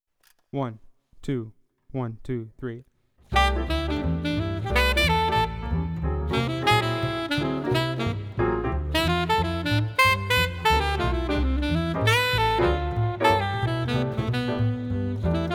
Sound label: drums line